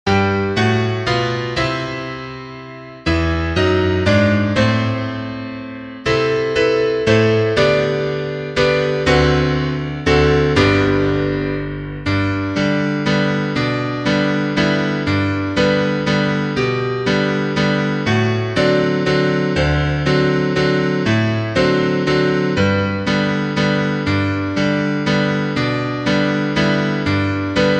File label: piano, sheet music